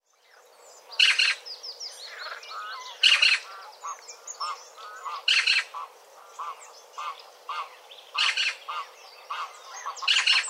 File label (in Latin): Pica pica; SETE